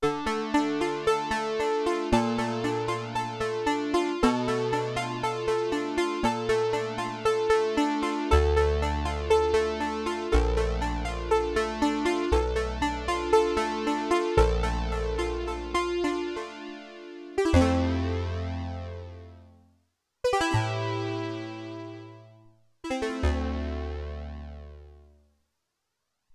PIANO; Factory

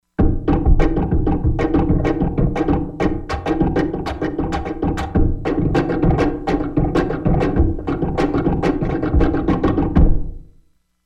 Horse Hit, Korg MSC-16S; Korg MSC-16S Environment

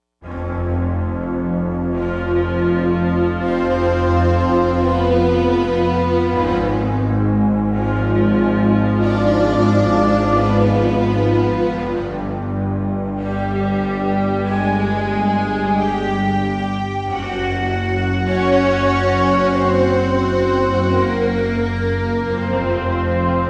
Lush Mix

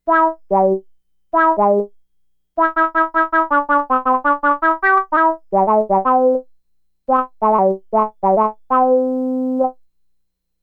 Funny Cat, Roland SH-2000

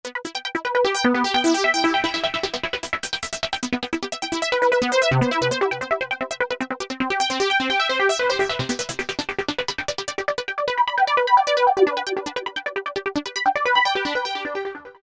Simple Arpg, Korg MS2000; Factory Patches

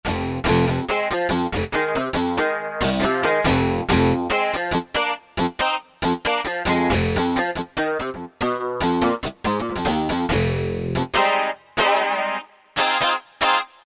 Clav, Korg M1; Factory preset examples

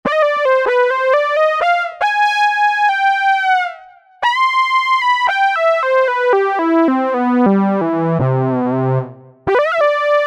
GR Lead, Roland V-Synth; Factory Patches

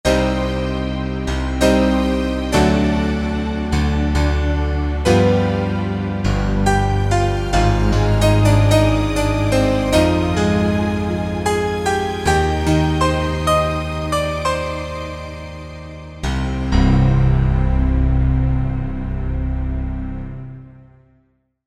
Power Octs

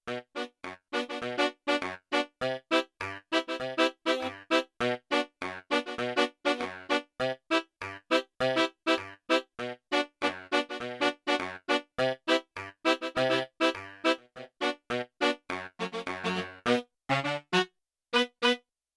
ff p cre, Yamaha TX16W Four Saxes

